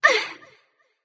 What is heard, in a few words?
grunt jump light; smite; voicepack; hel